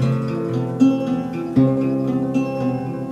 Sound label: guitar